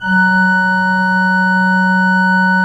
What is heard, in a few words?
SWL F2, Cathedral Organ, SCHWELLWERK; Propeller Island